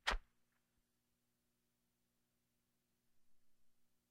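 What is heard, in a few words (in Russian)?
полет; воланчика; бадминтон; звук полета воланчика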